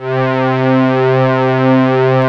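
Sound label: Juno brass, analog synths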